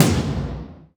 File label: Korg 01W MegaDrums; 300 Drum Machines